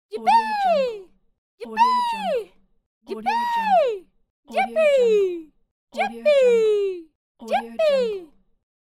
female yipee pack; medusamusiclab

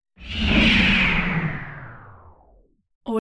time travel whooshes

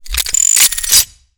weapons foley metal servo power; future weapons 2 source

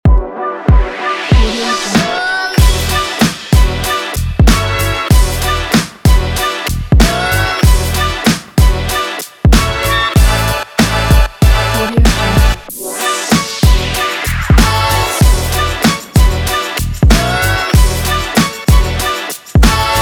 upbeat hip hop

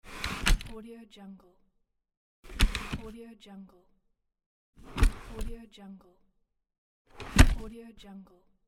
baby chair

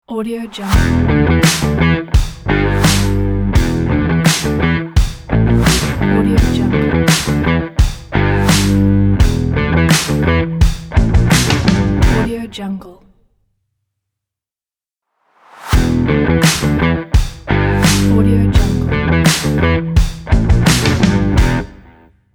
rock logo; dailywaves

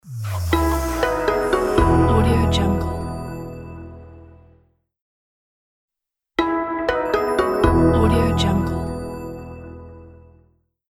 clean elegant logo; matsteiner-fx

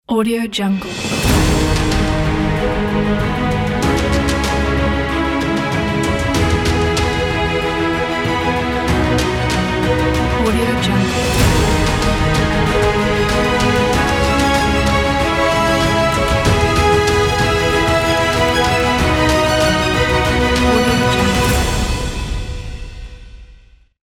epic cinematic ident

